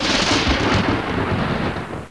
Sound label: Thunder